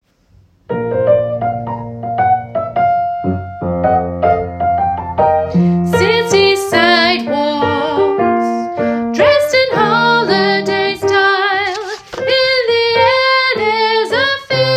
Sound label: bass